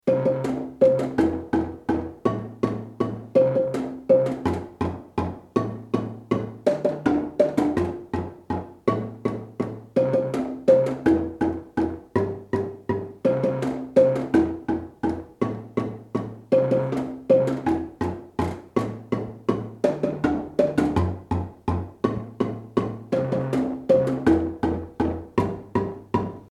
bass var, marimba

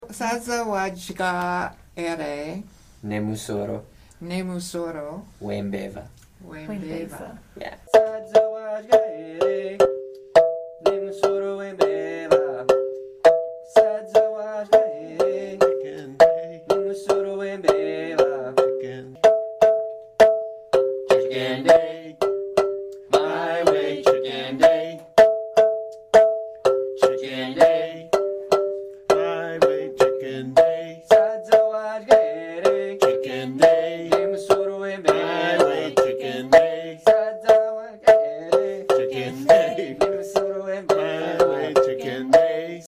singing; chikende

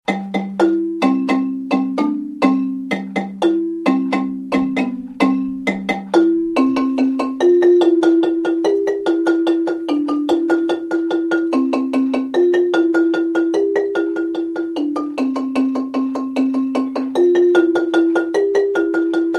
t1 rolling, marimba; chiradza

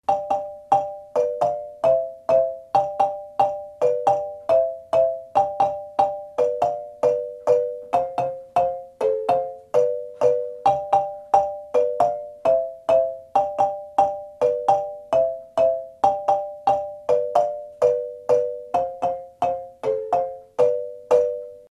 lead middle chords, marimba; kare mugomba

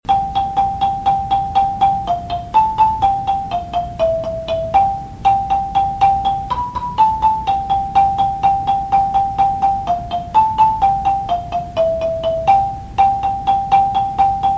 lead main signal, marimba

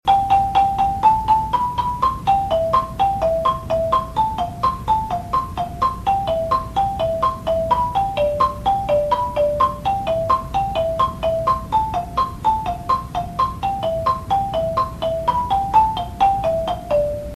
lead middle high, marimba

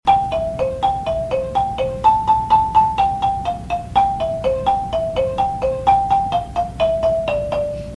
lead middle high low, marimba

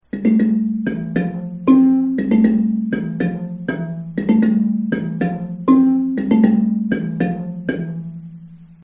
bari layering end, marimba

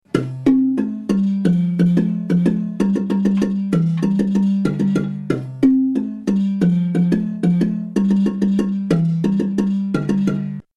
baritone, marimba; Part 2; Baritone -- Jim; babamudiki

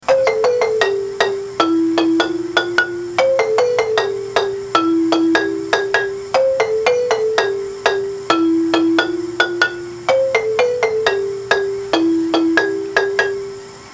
lead low, marimba; manhanga

